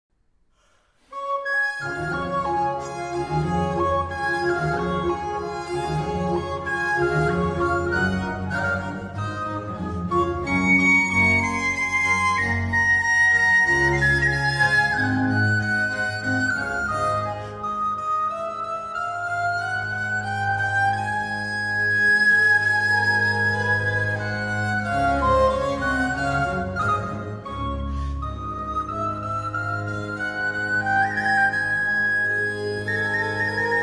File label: Allegro, For Recorder, Strings and Continuo